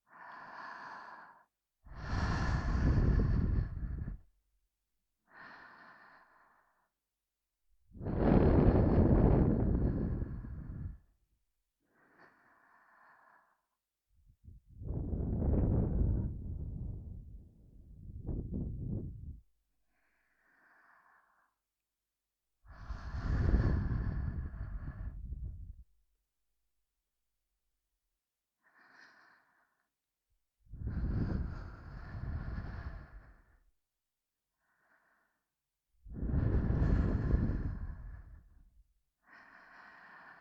吐息（左耳）; 純真無垢なティナの癒やしの魔法; バイノーラル音声作品

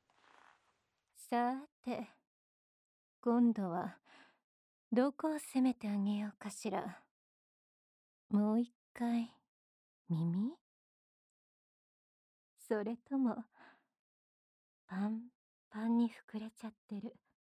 ドスケベ人妻～耳舐めの午後～執拗にぐっぽり耳舐め

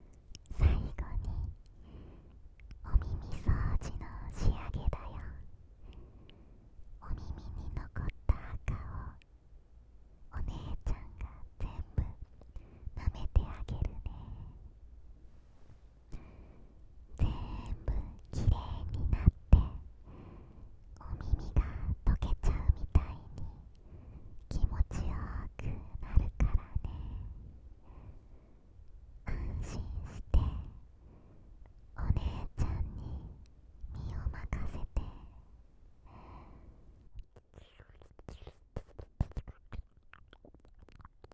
お姉ちゃんのとろとろ耳舐め掃除（右耳）; 心拍音off

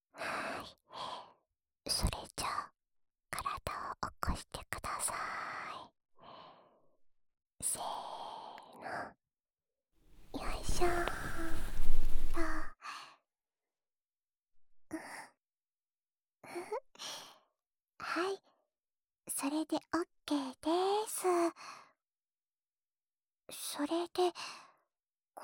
右耳の耳舐め, ASMR; 唾液いっぱい使って……ぺろぺろしちゃいますね♪

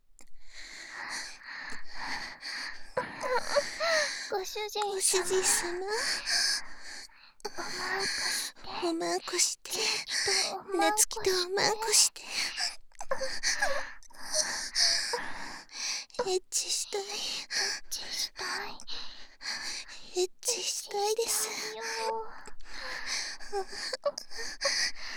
エッチしよ 喘ぎ, バイノーラル; ハーレムオナサポ 4人のメイドがご主人様のオナニーをお手伝いするバイノーラル～文、千曜、名月、莉桜～

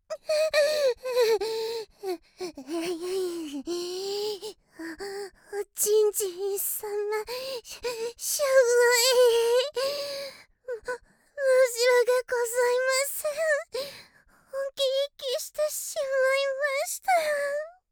はぁっ♥はぁっ♥あひぃ…♥

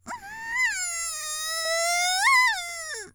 絶頂「んんんーー！」くぐもり; 綾波系; 少女

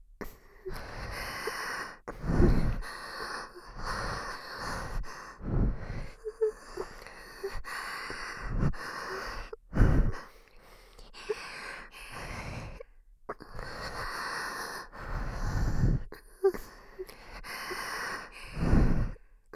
吐息

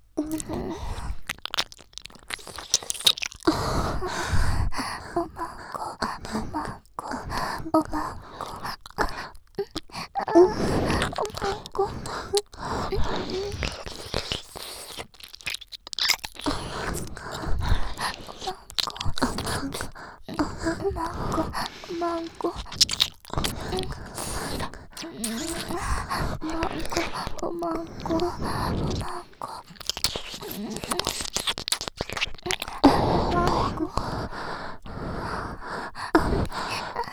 耳舐め 喘ぎ; お屋敷メイドのハーレム淫語ヘヴン; バイノーラルオナサポ音声

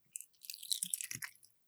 くちゅ音, ASMR

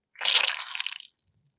射精音

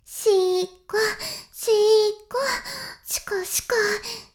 しこしこ音声, ASMR; 白くて黒い魔性円環

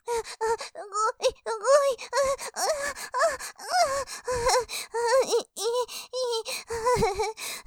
喘ぎ, ASMR; さらに感じて、ドロドロに気持ちいい; ループで楽しむ！シェフの気まぐれ抜き抜きコース; モノラル

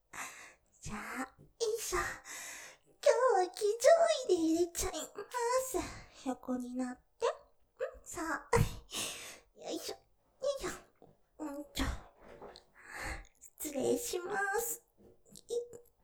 喘ぎ◆騎乗位でいれちゃいまーす♪失礼しまーす; ステレオ

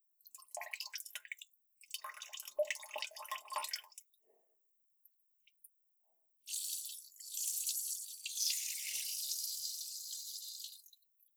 おしっこ, トイレ; おしっこのみと編集版

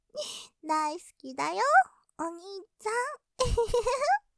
余韻; 大好きだよ。お兄ちゃん♪; ループで楽しむ！シェフの気まぐれ抜き抜きコース; モノラル

